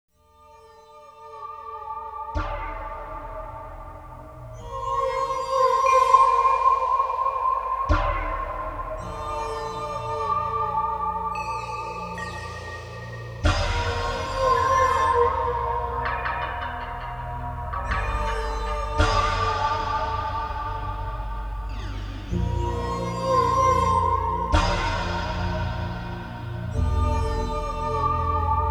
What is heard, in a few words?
Underwater (Ambient); zak mckracken and the alien mindbenders; fm towns